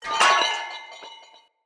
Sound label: Corrupted Battle Dog Bell Charge Start; Foley